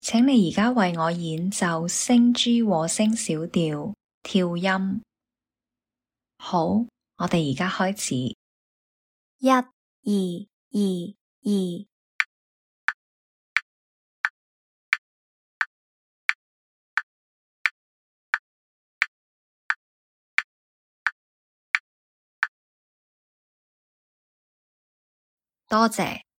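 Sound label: G sharp minor harmonic scale staccato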